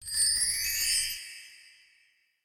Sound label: item Pick Up; Keepsake; interface